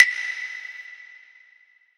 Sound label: Clave Bright Wide, One Shots Drums Wood